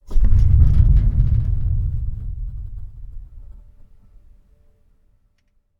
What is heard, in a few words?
Cabinet Doors Low Rumble